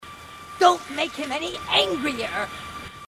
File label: grunt; h3dialogue; grunts